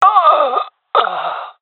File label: death; Counter Strike; CS:GO; seal diver